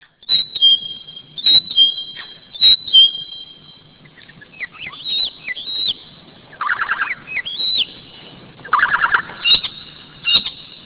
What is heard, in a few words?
TIEKE